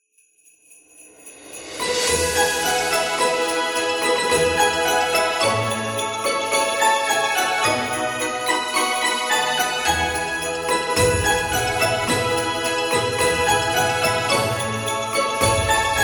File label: Christmas Carol